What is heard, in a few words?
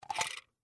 Food Scale Off, Object, Heavy; Household; BlastWave